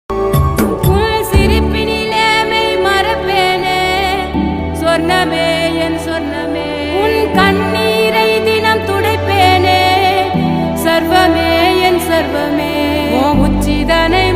song; aranmanai 4

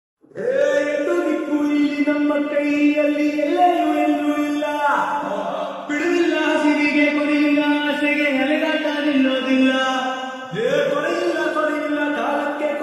kannada song

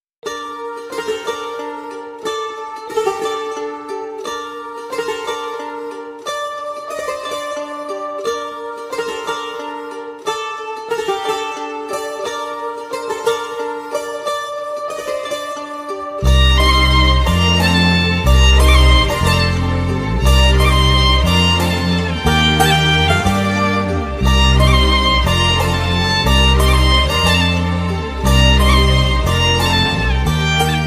love bgm ringtone tamil ringtone bgm ringtone; theri; vijay ringtone

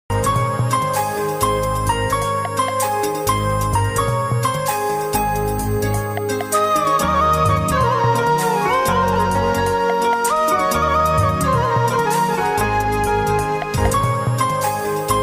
sad ringtone, emotional

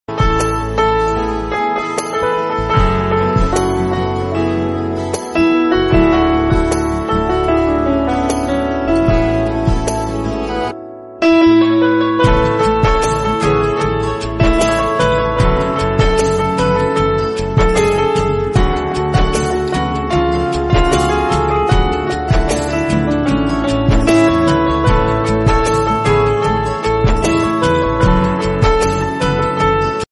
instrumental ringtone sad ringtones

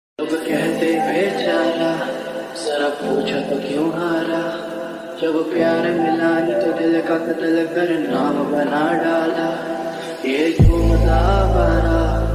lofi mix ringtone lofi remix ringtone lofi bgm

